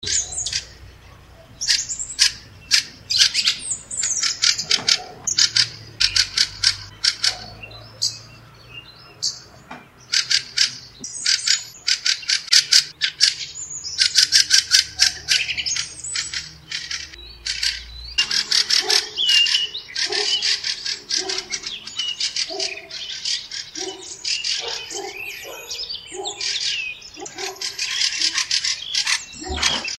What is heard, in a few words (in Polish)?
kwiczol, ptaki